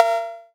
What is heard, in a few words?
Percussive FX; Vengeance Samples; VEH1 Percussion